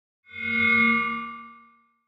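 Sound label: Chime Electric Bell Metal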